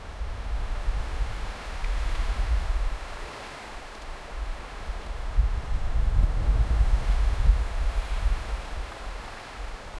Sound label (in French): le bruit des vagues loint, ambiance